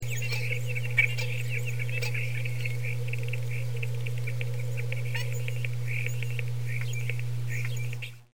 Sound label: starling